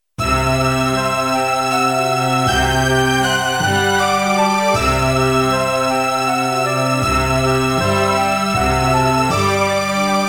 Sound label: orchestrated; Golden Sun